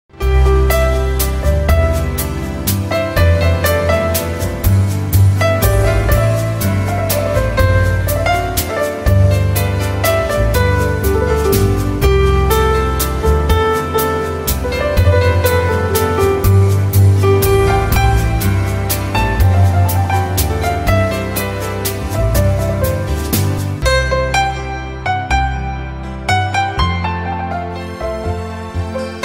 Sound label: instument